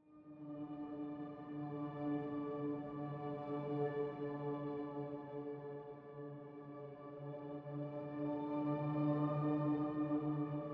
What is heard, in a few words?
Climbing The Tower Ambience; bramble the mountain king; ps4 ps5 switch windows xbox one xbox series xs; Pesta